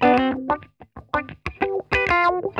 ROLLER, Funk Guitar; Zero G - Funk Guitar

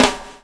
BUBL, BUBBLE; Spectrasonics - Backbeat

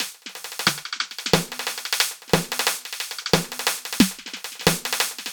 Snare, Snare Patterns; Beat Components

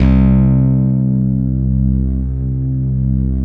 SYN, Roland JD 800; Sound & Vision - Gigapack I CD 2; JD 800/990

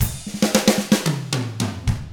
POP ROCK, Drums; ILIO; Double Platinum Drums 1

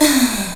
VOX SHORTS; Megabass Remix! 2

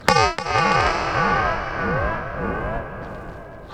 SPACEY, Funk Guitar; Zero G - Funk Guitar

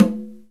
Conga Low, Room

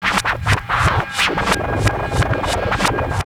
Scratch Tape; Ambient Grooves

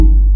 KARIMBA G1; Big Fish Audio; Synth City

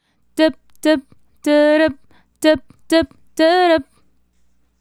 Female Solo, Vocal

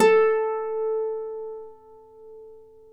Celtic Harp, Plucked Strings

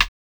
RIM; X-Static Goldmine 1

